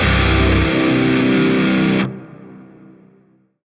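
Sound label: Shawzin Pentatonic Major Chord D; Warframe; Grineer Shawzin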